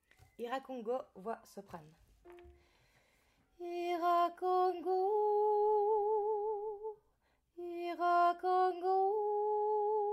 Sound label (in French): Soprane; I ra con go